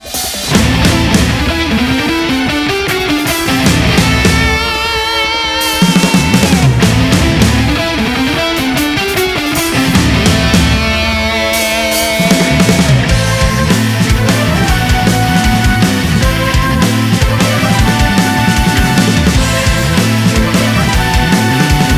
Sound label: Grand Battle Medley